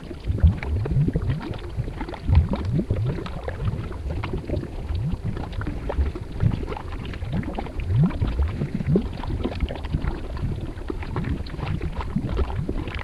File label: tar loop